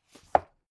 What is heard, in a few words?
book shelve hard